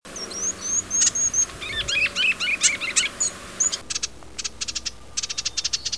rubinovogolovyy korolek golos

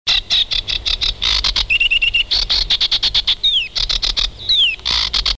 tonkoklyuvaya kamyshevka golos

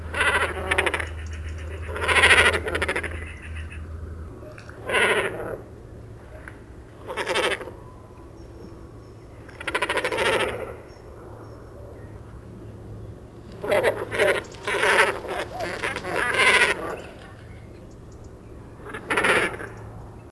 bolshoy baklan golos

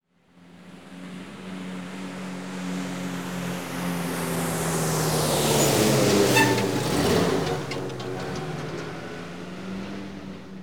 zvuki kamaza

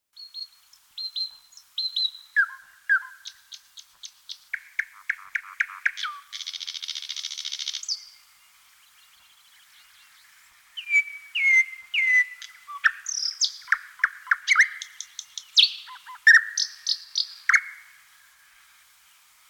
obyknovennyy solovey golos

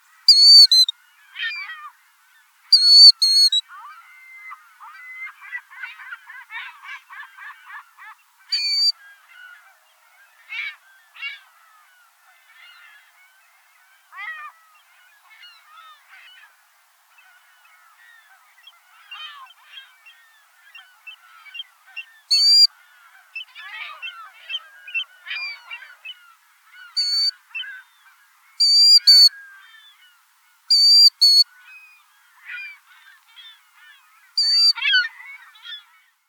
zuek dvugalstuchnyy golos

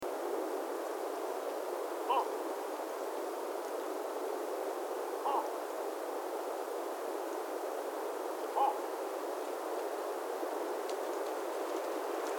bolshaya vyp golos

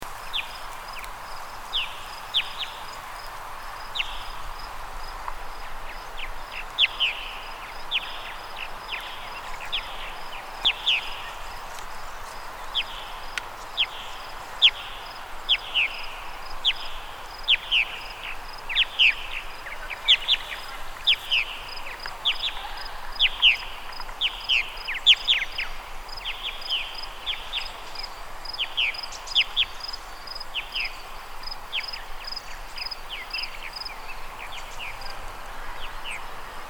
lastochka bolshaya golos

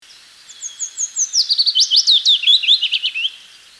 penochka vesnichka golos

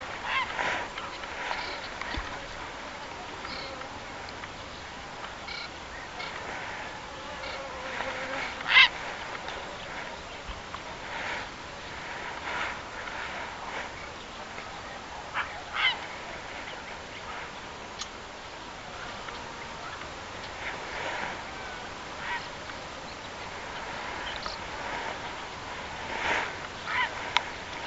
seraya caplya golos

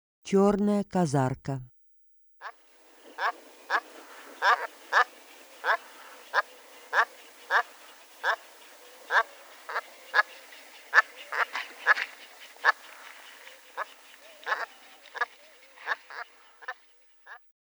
chernaya kazarka golos

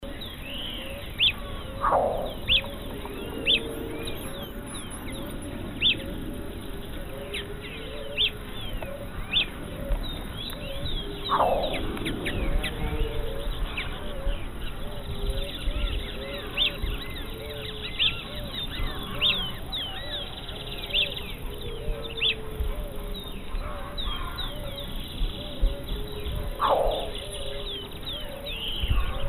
kvakva golos

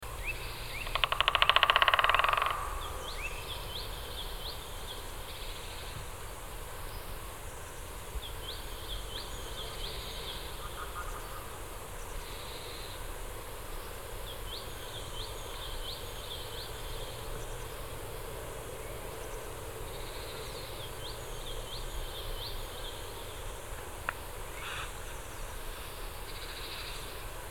belospinnyy dyatel golos

